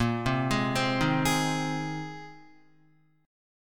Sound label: Bb 7th Suspended 2nd Suspended 4th Bb, Guitar 4E 4A 5D 5G 5C 6F; Bb7sus2sus4 arpeggiated; 6,3,6,5,3,3